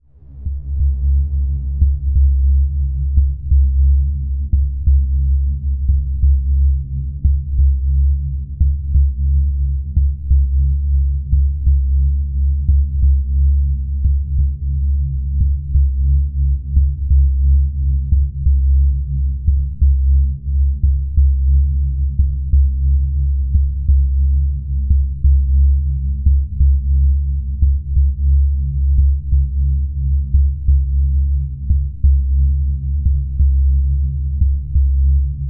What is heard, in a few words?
Drone Bed Heartbeat; Production Element Imaging; AdobeCC